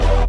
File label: Chord